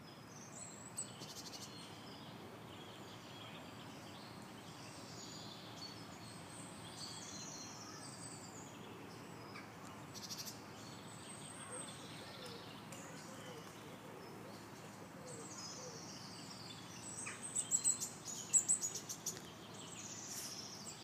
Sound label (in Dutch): vogelgeluiden amelisweerd